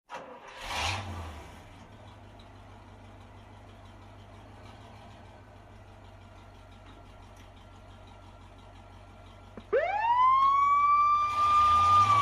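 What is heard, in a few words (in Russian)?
sounds of fire engine moving away with siren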